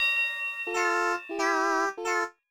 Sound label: Jingle, LOSER; Big Brain Academy; nintendo ds